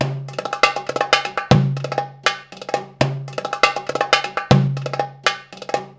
PERC LP, Arabian Traditions

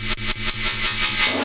Transform Rev; Jungle Warfare 1&2

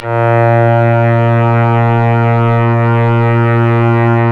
Synclav FM STRINGS A#; Big Fish Audio

